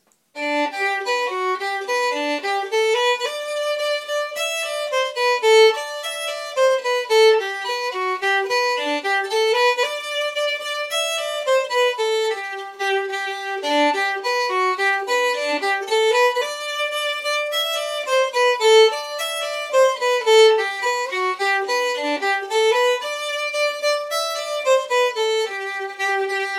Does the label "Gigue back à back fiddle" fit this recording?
Yes